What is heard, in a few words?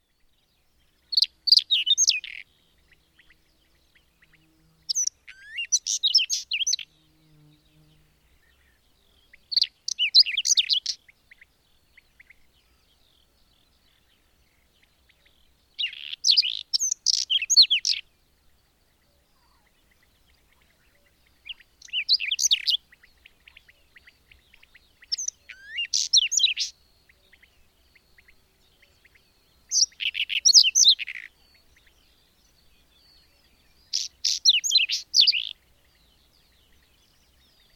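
Saxicola rubetra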